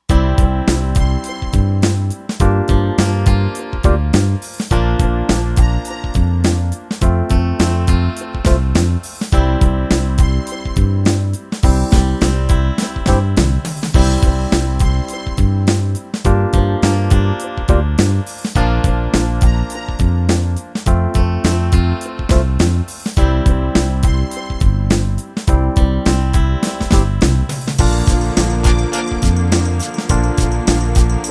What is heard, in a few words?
Pop